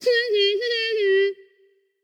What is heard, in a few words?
nv tongue; smite; voicepack; morgan le fay